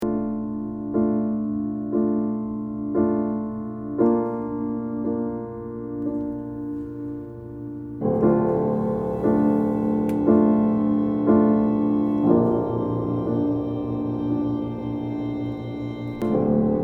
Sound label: Drama Scene